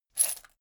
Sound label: chain mail armor movement